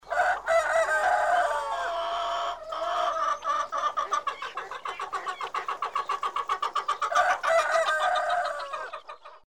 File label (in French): Cocorico